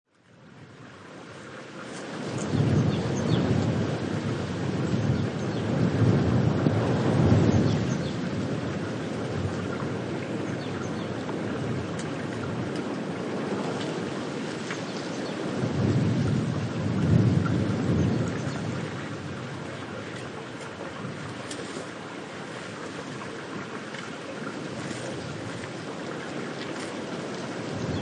Windy Forest, Blustery wind blowing through trees, a stream trickles nearby; few birds tweeting, occasional ground movements